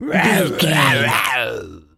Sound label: tas devil cartoon